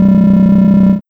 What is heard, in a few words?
Sound FX Tech Bleep